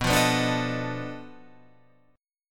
B 7th Sharp 9th Flat 5th, down

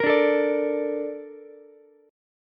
A sharp Major 7th, Ukulele; A# M7 strummed

